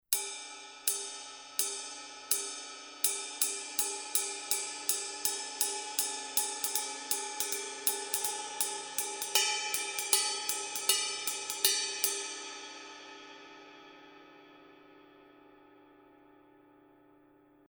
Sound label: Color Sound 900 Black Mega Ride, Paiste